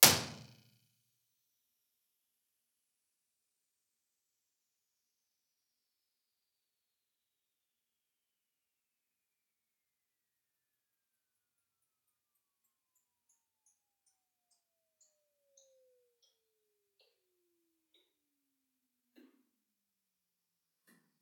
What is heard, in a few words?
impulse; RT60